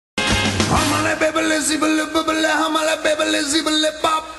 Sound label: haha